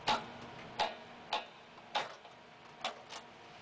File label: Selector, Train; OpenBVE